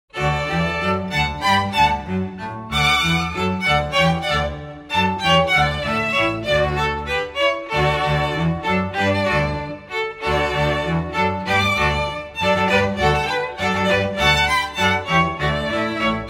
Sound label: Halleluja, Everything String